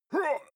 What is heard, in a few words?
male shout hit soft